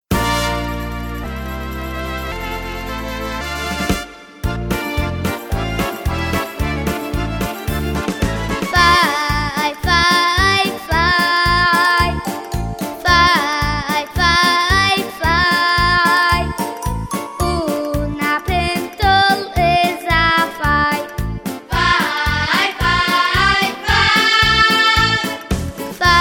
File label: niggun